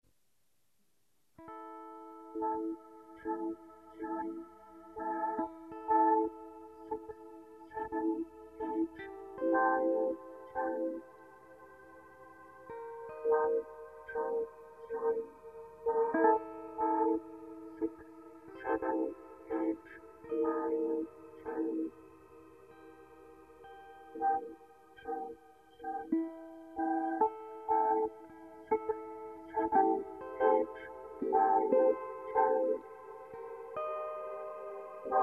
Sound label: Vocorder